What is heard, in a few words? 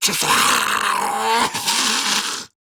Death Grineer Ghoul Sargeant; Grineer Ghoul Drill Sargeant; Battle Barks